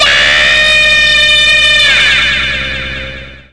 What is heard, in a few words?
shield charge